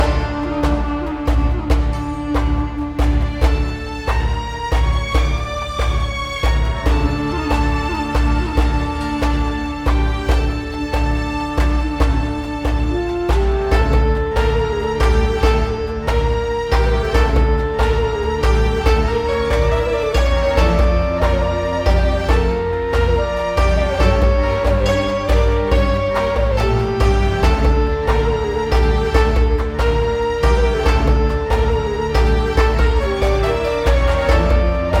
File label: Arabian Theme Loop; skyhook